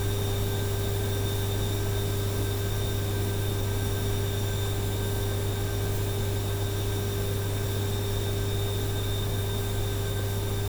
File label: turbo, noise